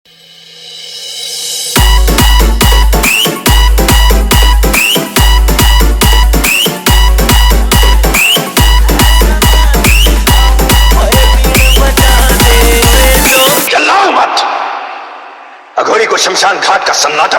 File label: Edm Dance Siren Mix